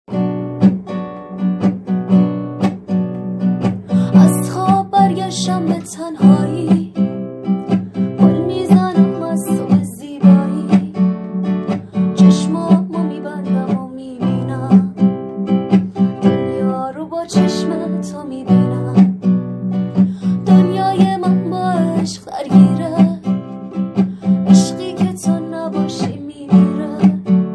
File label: music